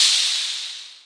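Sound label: hi-hat, Maestro Rhythm King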